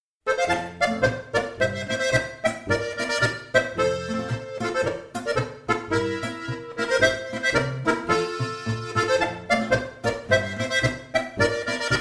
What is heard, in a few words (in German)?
volksmusikstueckl